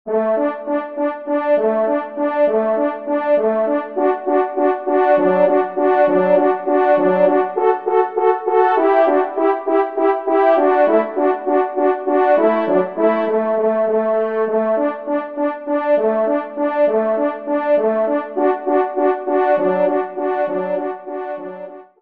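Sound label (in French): duo, ensemble, trompe cors; Kling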